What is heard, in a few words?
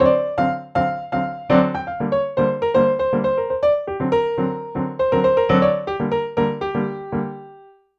Piano; Dr. Fink's Funk Factory